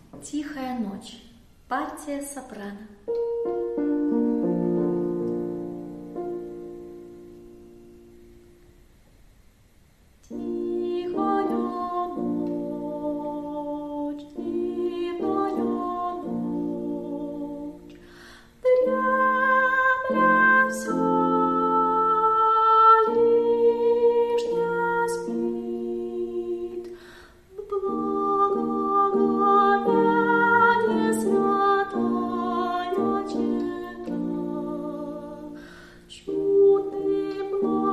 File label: soprano; Tihaya noch